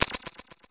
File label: magic chimes